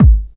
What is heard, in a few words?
Bassdrums; Vengeance Samples